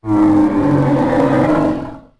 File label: growl, Dino